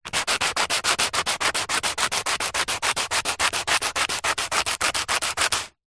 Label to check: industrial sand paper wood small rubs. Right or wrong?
Right